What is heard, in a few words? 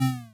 shoot; Space Shooter Alien